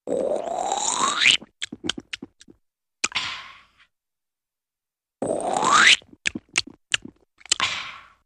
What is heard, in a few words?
Big Wet Slurp Lip Sma